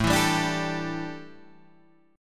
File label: A 7th Suspended 4th, chord